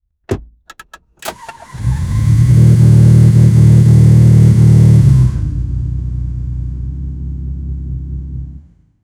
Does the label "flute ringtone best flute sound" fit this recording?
No